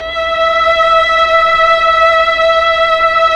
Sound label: STR, Melo. Violins, Slow Violins; Roland LCDP09 Keys of the 60s and 70s 1